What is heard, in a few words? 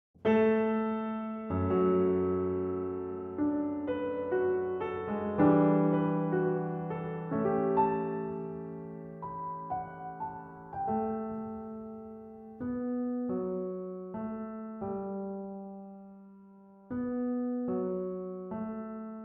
Die Meistersinger Von Nurnberg, Arr. For Solo Piano, Am Stillen Herd; Mottl